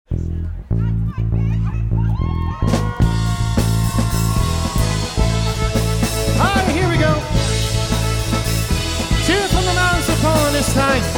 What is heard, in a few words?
Polka, Live Jam